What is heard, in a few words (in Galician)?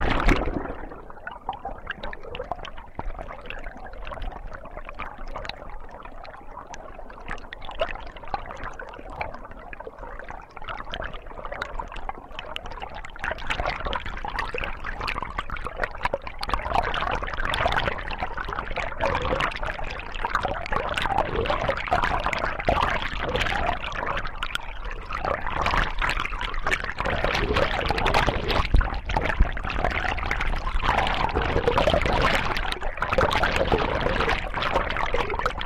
ouvido debaixo de água; Fraguinha; Ribeiro